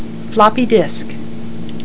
FLPY DSC

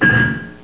ERROR

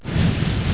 BLOK MOVE; WORMWRLD

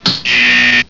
BUZZER

